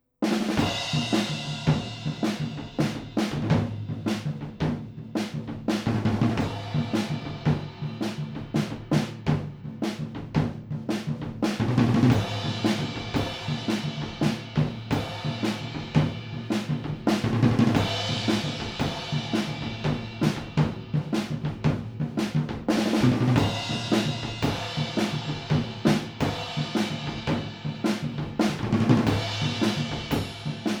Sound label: MS Fathead Drums